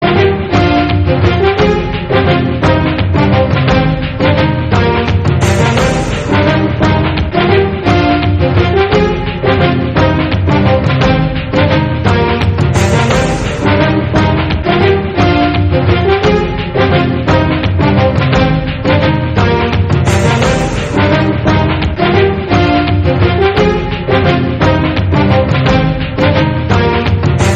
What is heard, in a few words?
battle before; Hime Frontier; final battle; android game music